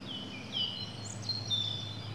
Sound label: birds, ambient animal